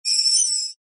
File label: Metal Scrape High Pitched